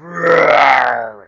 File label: zombie groan massive; plague